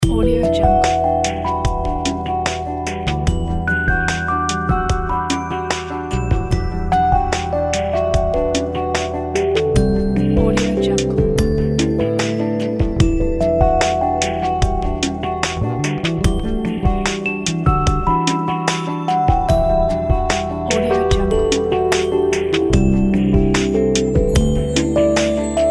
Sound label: melodic logo